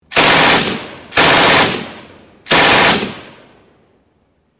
arme allemande 2nde guerre mondiale

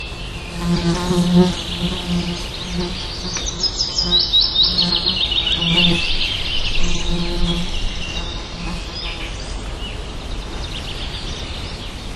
forêt

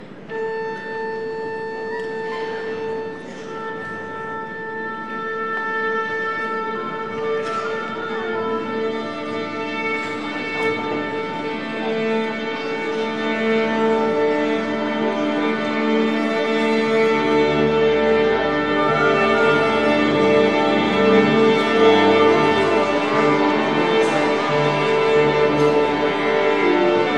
orchestre qui s'accorde

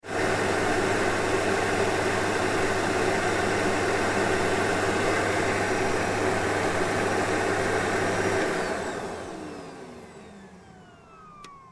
aspirateur off

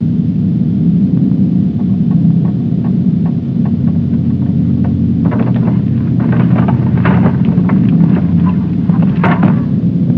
tremblement de terre

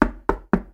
frapper à la porte

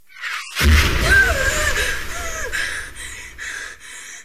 reveil en sursaut par bombe

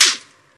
claque

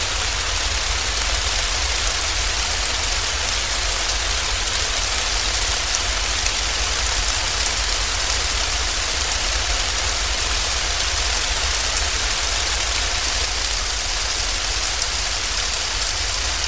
pluie

